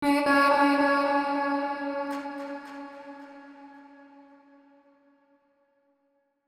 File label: 110bpm E, Vocalpack; Vocals